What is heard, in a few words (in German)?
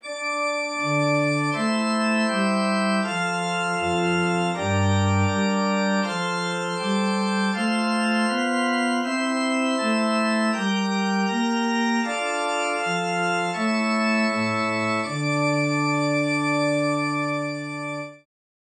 Zwei simmiger Contrapunkt; Ronge; Correzioni di Albrechsberger